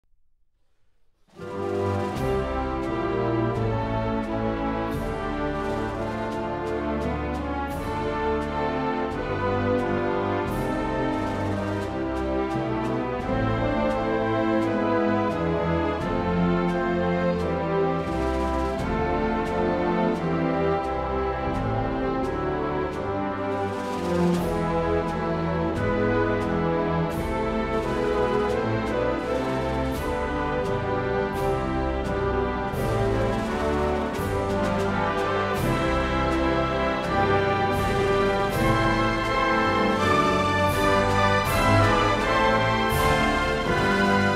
Jamaican anthem

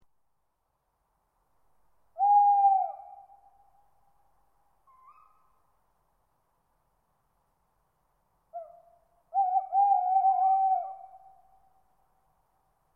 Tawny owl, high-pitched calls from a tawny owl; Thomas & Friends

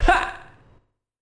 voiceclip hah

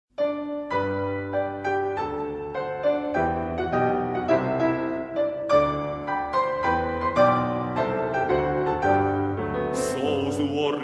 Guinea-Bissau anthem

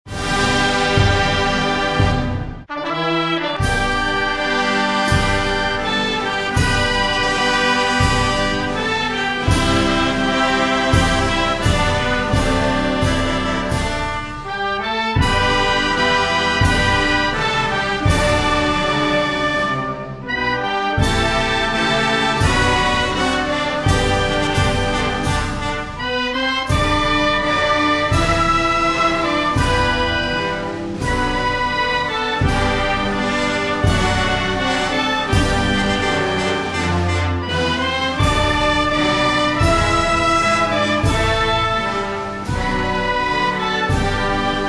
National Anthem of Vietnam

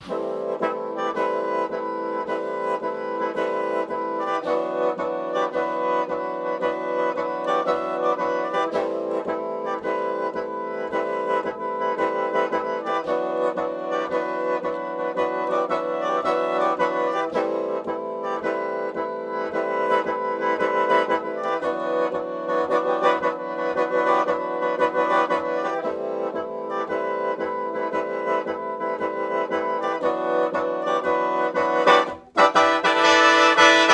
Low Key Gliding

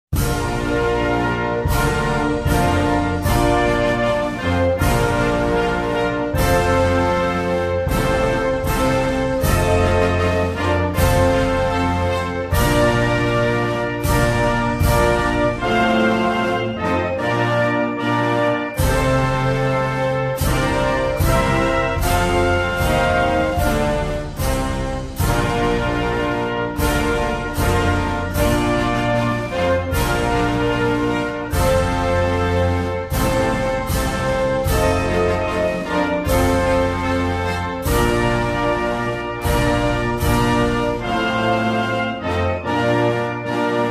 Latvian anthem

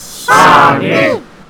crowd cheer; Radio Entry Tier List

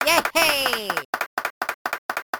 clap; Luigi; Super Nintendo World

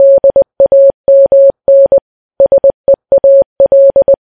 Morse

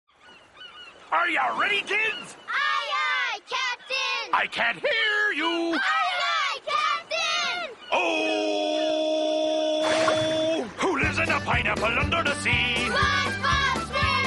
Theme Song

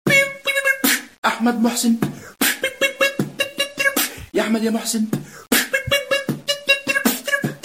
National anthem; turtle national anthem

